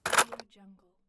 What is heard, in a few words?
board game plastic pieces pick up